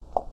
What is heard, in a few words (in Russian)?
Выбросил предмет